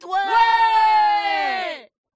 Foule Ouaiiis; mirumiru

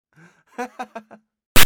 Raton Laveur Rire; mirumiru

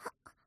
saute; mirumiru

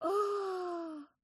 Grenouille Oooh; mirumiru

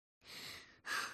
Chauve Souris Essouffle; mirumiru

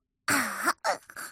JUBILATION; MIRU; mirumiru

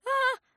Herisson Aaahhh; mirumiru

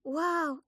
Grenouille Waw Court; mirumiru

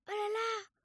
Grenouille Olala; mirumiru

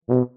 Tuba son premiere seconde; mirumiru

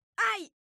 Herisson Aie; mirumiru

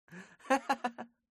raton laveur rire; mirumiru

